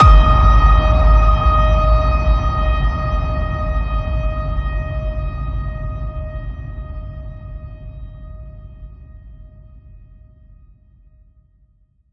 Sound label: Ping, D sharp